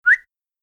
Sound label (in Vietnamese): tieng huyt sao 1 tieng duy nhat